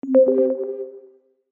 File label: call